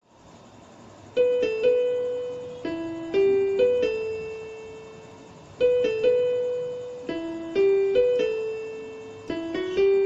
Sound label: background music bgm on keyboard piano; manam movie